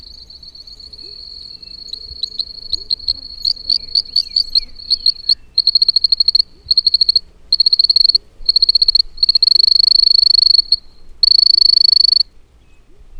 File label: Pseudopaludicola facureae